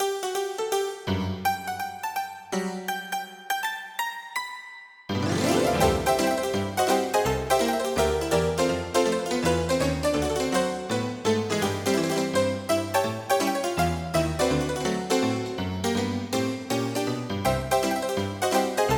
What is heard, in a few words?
ragtune; Massive MIDI Collection